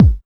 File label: HSE BD